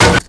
Wood Light Block; blocking

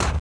Desert Step Left; Movement

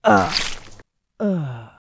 Mob die; Bossmob; 야수맹; 염우귀

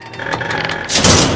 boss gate die

